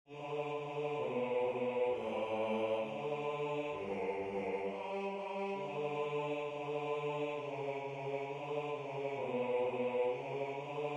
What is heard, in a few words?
Bass